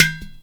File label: LO SLAP UDO, NIGER UDU; Spectrasonics; Heart of Africa